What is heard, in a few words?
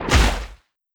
Hit effect